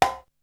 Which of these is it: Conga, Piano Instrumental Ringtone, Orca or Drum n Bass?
Conga